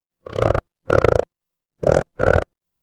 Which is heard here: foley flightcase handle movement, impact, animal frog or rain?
animal frog